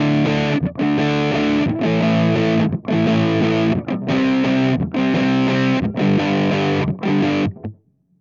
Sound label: Amplitube X-Drive Big Muff Cab Sim